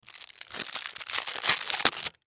Gumball Wrapper, Foley Gumball Wrapper Open Fast Plastic Food; Household; Blastwave